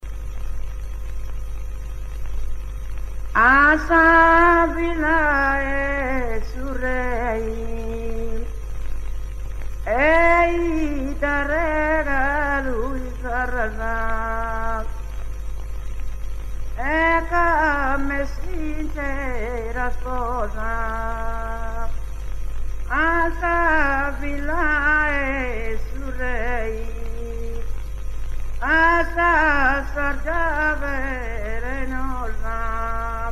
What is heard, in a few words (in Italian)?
mutettu, canti monodici non accompagnati; seui